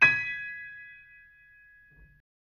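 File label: G#m highest, chords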